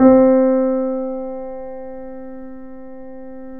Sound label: The Piano, C; InVision Interactive - The Piano; BOESINGSOFT